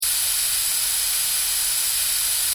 Spray Can: Spraying Loop; Objects; Stuart Duffield

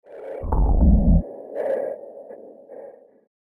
Lunar Landing Gear; Science Fiction Sound Effects

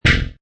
Metal Low plate; Metallic Sound Sound Effects

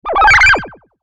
Twisted Theremin; Miscellaneous Cartoon Sound Effects; unusual

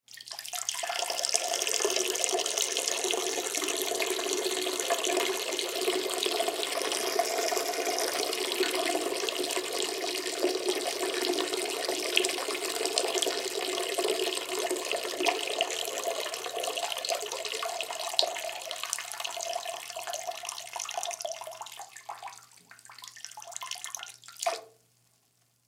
Human Urinate Pee; Urinate Pee In Bathroom Toilet Element Male 25 Year Old; Urinating; Blastwave

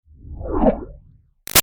Up Liquid Digitalness; Sound Design; Stops

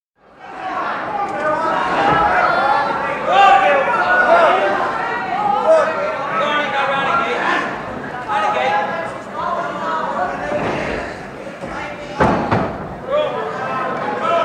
Shouts of encouragement; Crowds (Sound Effects:Backgrounds); BBC

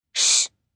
Shh, Whispering, 1 child, human voice, kid; Psst, 1 child, short, human voice, kid, speak, talk; Sound Effects:Voice; VoiceBox

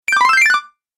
Game Collect Your Bonus, Video Game Sound Effects

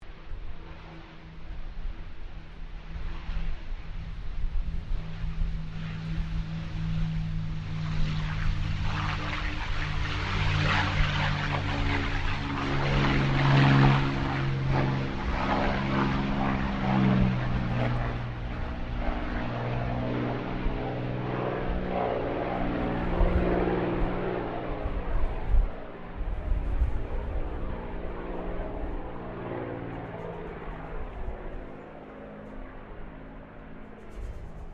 AIRCRAFT CAC CA-18 MUSTANG MK23 TAKEOFF WINDY; CA-18 /P-51 Mustang; Airplanes (Sound Effects:Vehicles)

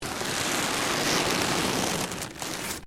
LEATHER MOVEMENT

